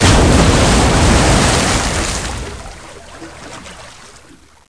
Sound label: water crash, debris; XDM